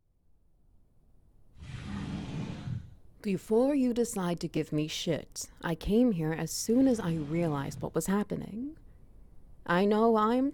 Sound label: Ambience, Wet Noises; Professional Monster Fucker